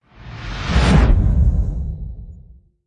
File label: Stealth Wipe; Ninja Tracks